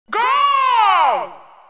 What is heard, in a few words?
Goal